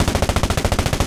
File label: loop shvak tnsh; guns; tanks; War Thunder